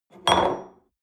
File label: espresso upset table soft, restaurant cafe; sport-freizeit